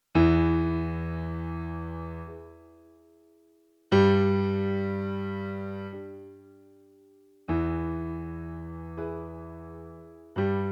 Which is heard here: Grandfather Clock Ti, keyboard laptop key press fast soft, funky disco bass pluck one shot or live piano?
live piano